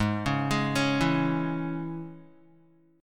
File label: Ab Suspended 4th Sharp 5th Guitar, chord; Absus4#5 arpeggiated; JGuitar